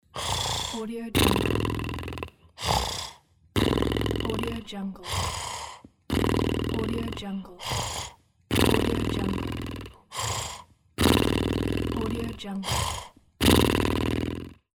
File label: snoring